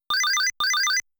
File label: Shot; game sound effect; dig dug